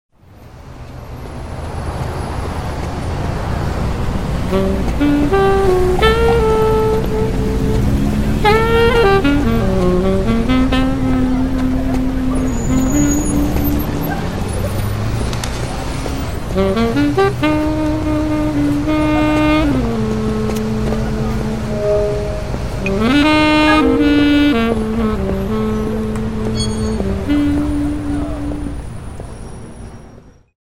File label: Sax Drugs and Rock Roll